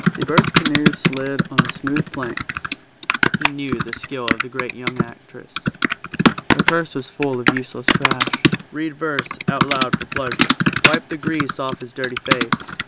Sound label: keyboard